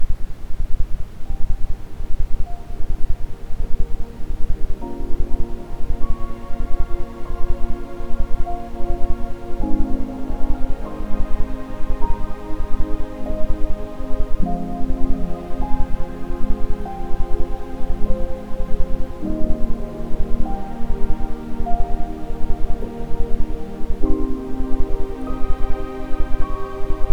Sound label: isochronic, Ambient Sound; Gefuehle Liebe; isochrone toene